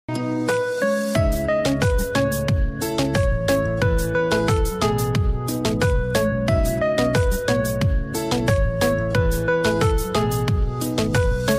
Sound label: instrumental version ringtone